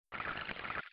giesskanne; harvest moon

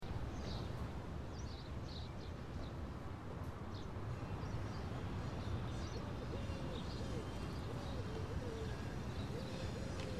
draussen hintergrund; life is strange; dorm

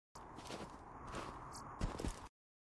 beschreibung gegenstand; borderlands 2

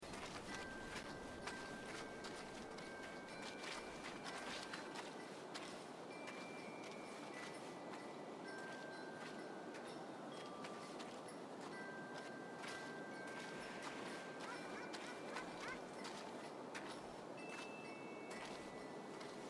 christmas market hintergrund; Life is Strange 2; gamesoundopedia

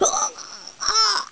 sterben; Tomb Raider PS1; lara

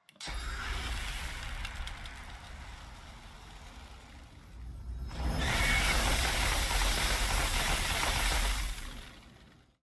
Wassermagie; divinity original sin ii

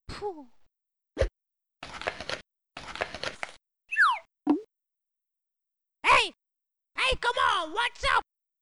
gelangweilt; jazz jackrabbit 2

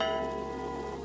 inventar glocke; botanicula

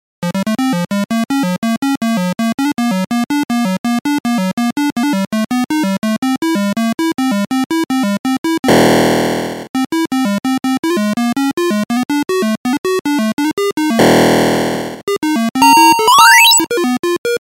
laufen; snail against squirrel

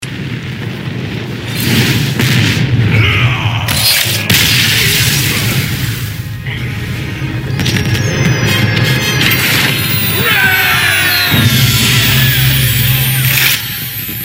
sieg; hearthstone

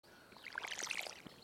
giesskanne; Stardew Valley

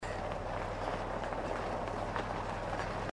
laufen auf stein; inside

